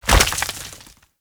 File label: Crystal Growths Detach; Citrine